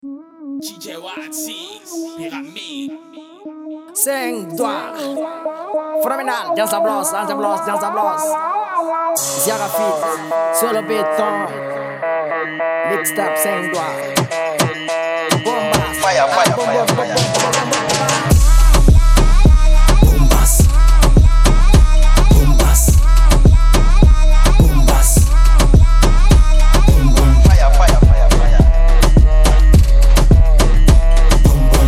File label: Boom Bass